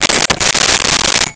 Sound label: Rotary Telephone Dial; Communications